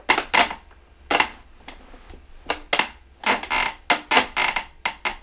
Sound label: Chair creaking